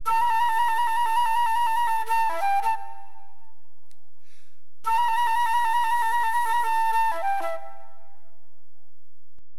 FLUTE; Wind; LiveAct Pool; AcidJazz Funk

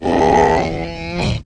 Animal Gray Idle; Deus Ex Demo; DeusExSounds

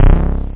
Bass Vector; Bass Notes

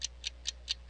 tick tock; direcpc

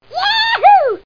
WAHOO; DR BRAIN; BUGS

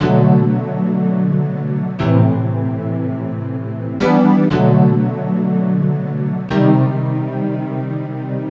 classical, loops

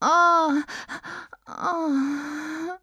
groan; Singles Flirt Up Your Life

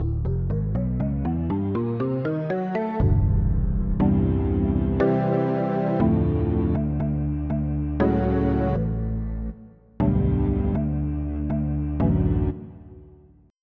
organ

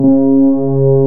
analog

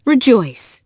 rejoice

